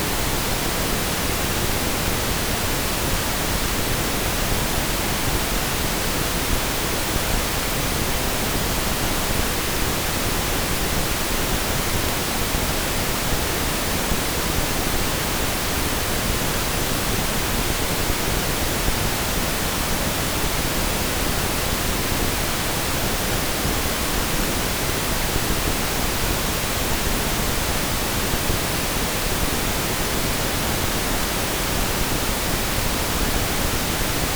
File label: Pink Noise